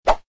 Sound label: jump; tempala